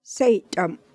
tickle

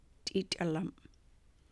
singing

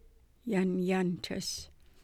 laugh at

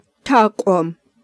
cough

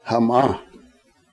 PIGEON

